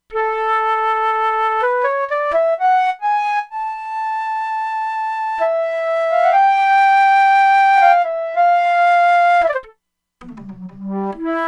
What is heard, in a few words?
Soft Flute, E-mu Proteus-2; Factory Presets